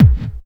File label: hardcore 1, bassdrum; Ueberschall; Techno Trance Essentials; DRUMS